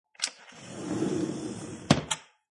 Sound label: Aluminium Window Slide And Close; Household